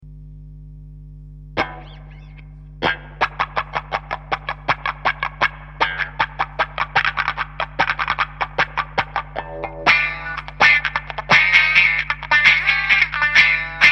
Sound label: wah